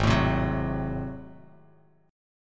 G sharp 7th Flat 9th G sharp down Bass Standard, chord; G#7b9; strummed; JGuitar